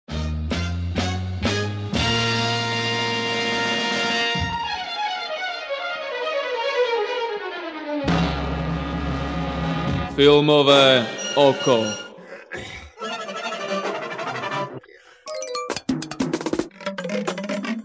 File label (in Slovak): jingle, filmove oko